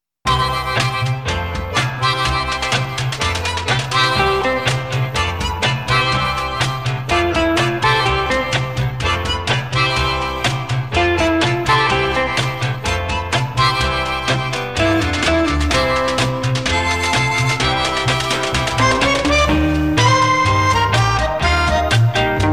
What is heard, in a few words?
Jingle